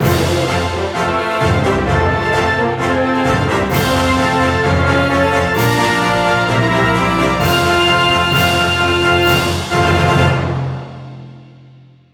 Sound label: Battle Cleared; Spooky Trails; Mario + Rabbids Kingdom Battle